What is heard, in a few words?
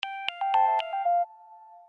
low battery, Samsung phone system; SGH-T369 Linx; msn